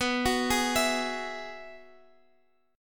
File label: G sharp Minor 7th Sharp 5th B